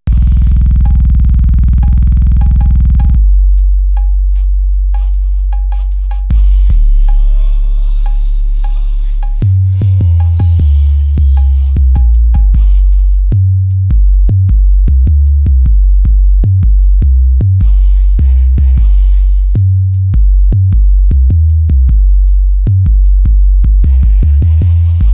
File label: slo mello bass